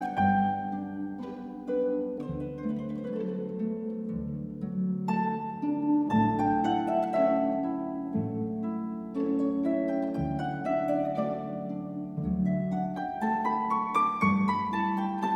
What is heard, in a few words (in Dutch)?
Harpconcerten in d - Andante